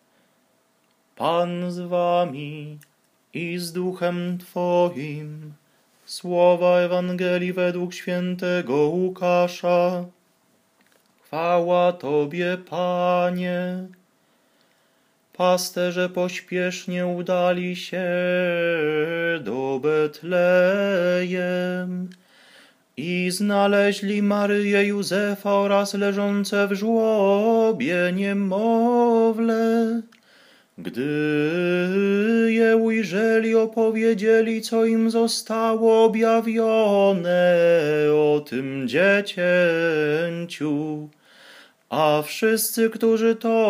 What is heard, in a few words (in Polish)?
mozarabski tenor; Liturgiapl; Rodzicielki